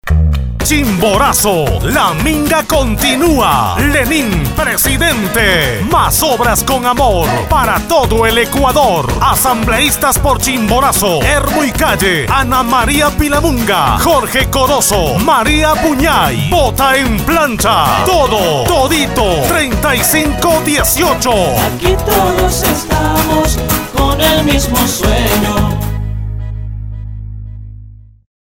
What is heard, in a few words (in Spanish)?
jingle; spot politica